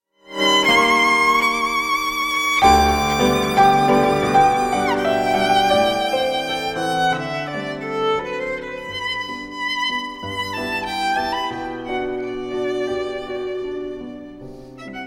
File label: chanson de matin for violin and piano; Sir Edward Elgar; op 15 2